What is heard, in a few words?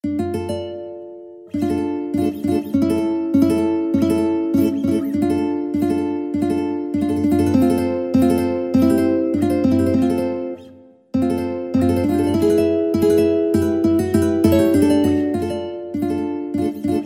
Beethoven 7 uke, ukulele